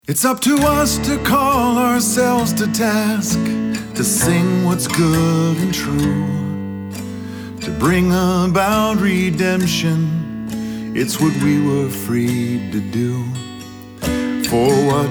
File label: GUITAR VOCAL; Aleinu-Lshabeiach